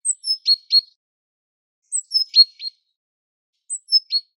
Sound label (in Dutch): Koolmees Roep Nederland